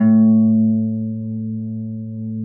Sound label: A1 HARP; USB Soundscan vol.62; AKAI